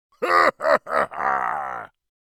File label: Orc creature laughing